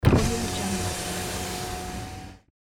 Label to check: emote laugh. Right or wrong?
Wrong